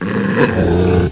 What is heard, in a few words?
dog